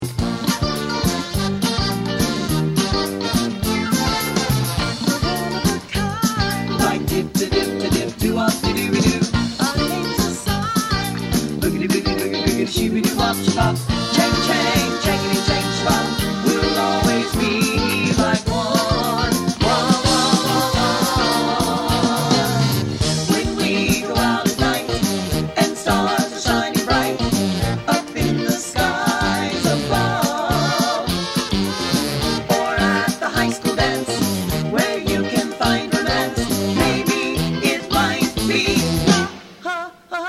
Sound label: no soprano